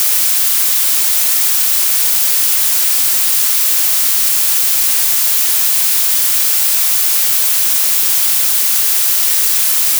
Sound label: Blue Noise